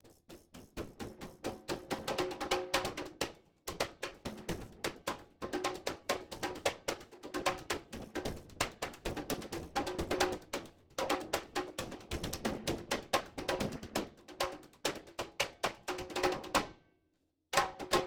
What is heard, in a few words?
CONGAS Residual Noise